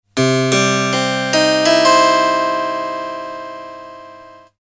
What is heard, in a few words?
Radio Moscow